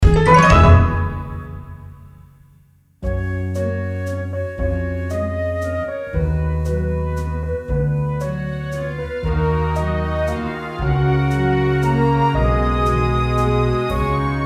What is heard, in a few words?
TENOR, SHOW CHOIR; THE CHRISTMAS SHOW; LA LA LAND